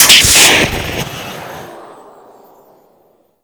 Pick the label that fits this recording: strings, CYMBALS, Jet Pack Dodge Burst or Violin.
Jet Pack Dodge Burst